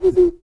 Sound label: BLOW, BREATH FX; Spectrasonics; Vocal Planet; Jazz FX